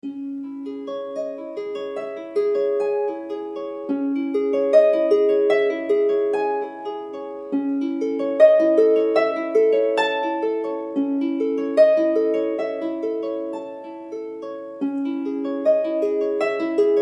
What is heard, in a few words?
Harp